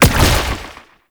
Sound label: Proto Infested Brachiolyst Teleport Start; Warframe; Abilities